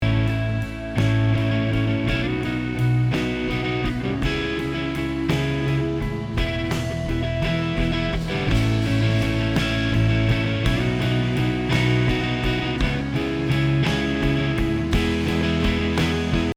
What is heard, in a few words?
Mono Drums Guitar Bass